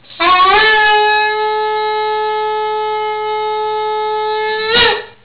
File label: Shofar tekiah